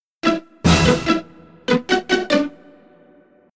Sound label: (Jingle) (Unused); rayman 2 remastered soundtrack